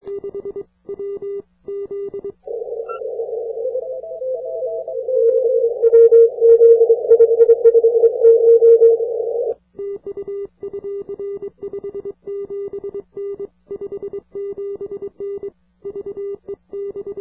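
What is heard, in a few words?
80m long path; A61AJ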